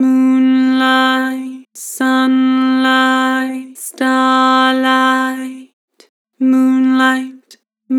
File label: Vocals, Flat, Moon sun starlight; Harmor